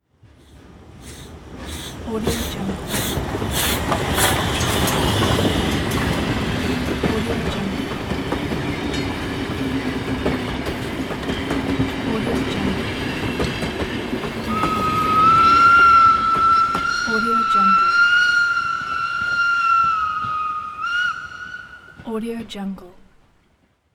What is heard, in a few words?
steam locomotive train pass by and whistle